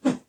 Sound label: Swinging Staff Whoosh Low